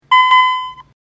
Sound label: beeps; esphome-vistaECP; esp8266